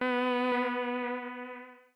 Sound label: echo, magic